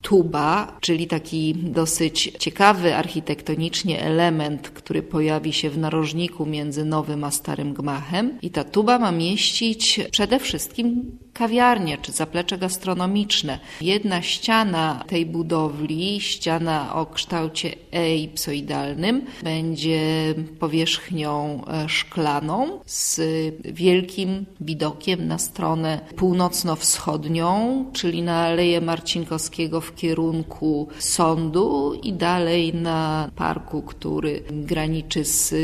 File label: tuba; spojrzec z gory na miasto ale skad